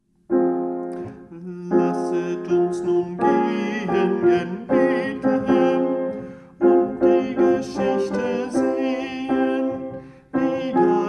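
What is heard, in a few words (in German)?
Lasset uns Sopran, Bach-Choräle Sopran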